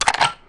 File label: minigun away; p4u; aigis